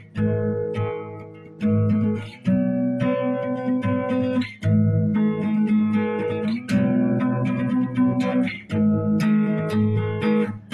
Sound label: guitar